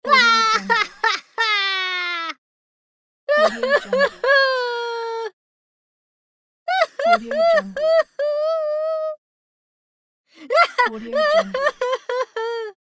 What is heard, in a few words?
cute cartoon character cry